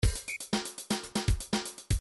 disco 1bar

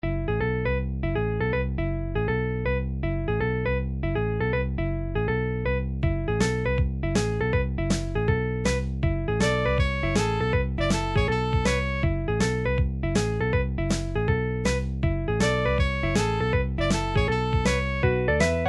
title jazz remix; gameboy turok 2

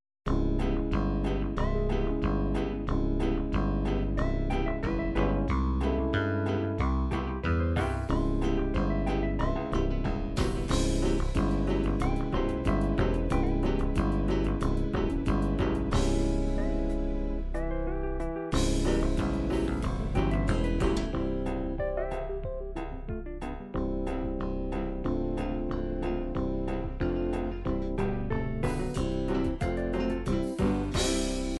ballad light pop

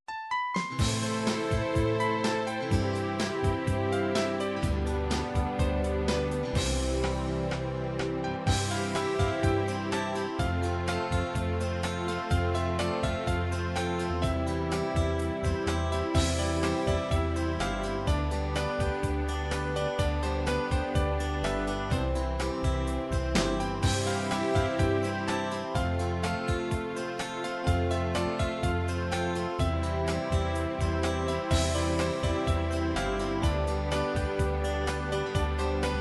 bulgarian, midi

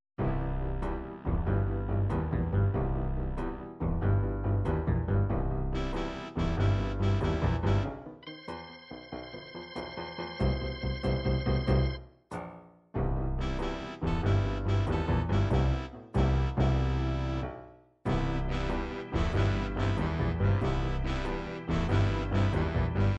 4/4 blues, midi

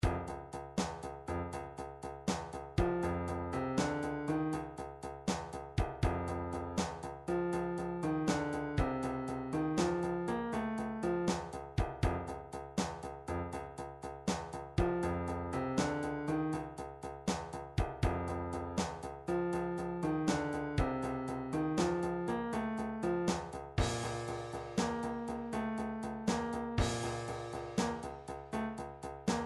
midi drums, blues

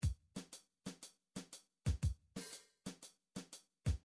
midi drums

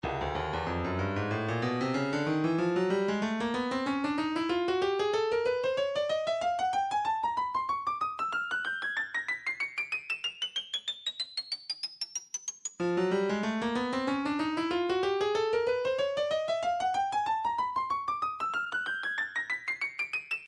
breakbeat midi 094 bpm; bang up c; Stylus Breakbeat Midi Files

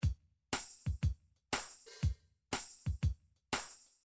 drums bouncy, midi drums

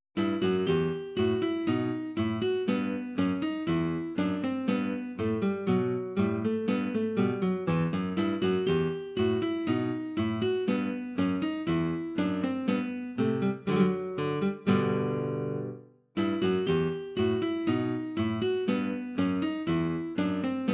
classical bourree, midi

